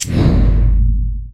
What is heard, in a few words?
nether portal ignite